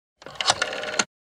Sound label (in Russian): phone